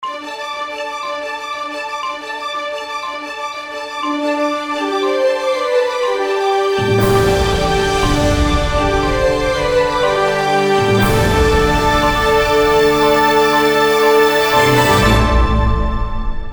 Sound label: News Announcement